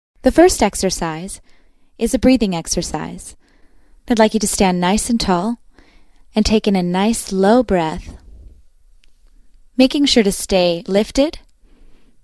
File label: The Shh male